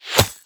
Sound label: Crossbow Bolt Impact Flesh; god awe full clicker 2018 gamerip